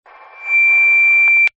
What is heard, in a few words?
technology cb radio burst noise static feedback